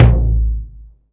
Tom Blue Room; Drums

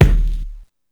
Kick; Ableton Live 12 Lite Core Library

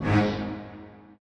Stab Horn Single

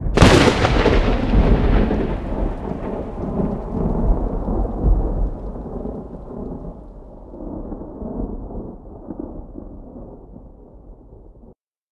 Lightning Thunder 1 shot; Journey

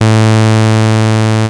Synth Note 1OSC A

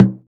Tom; Ableton Live 12 Lite; Core Library; Drums

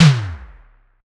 Tom Metatom Low; Drums

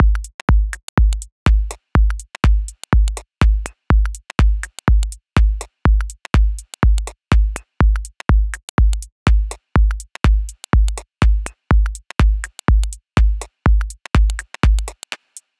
Tech House 123 bpm, drums; Loops; Ableton Live 12 Lite; Core Library

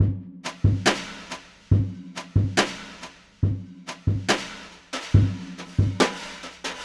Jazz Brushes 70 bpm, drums; Loops; Ableton Live 12 Lite; Core Library

